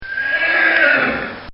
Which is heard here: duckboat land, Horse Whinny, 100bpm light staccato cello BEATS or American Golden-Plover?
Horse Whinny